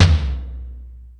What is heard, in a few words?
Metal Drums, E-MU Pro-Cussion; drum machine